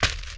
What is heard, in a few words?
mantrap foot